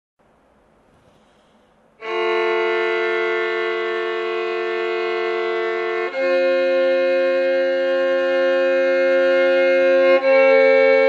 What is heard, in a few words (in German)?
doublestop F dur